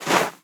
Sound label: snow, player steps; quake2